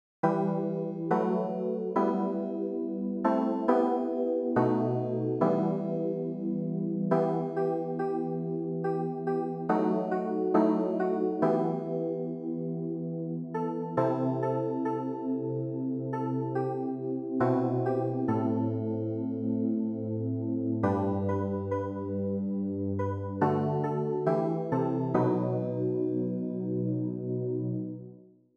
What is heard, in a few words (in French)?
Viens Seigneur Et Sauve Nous CLAVIER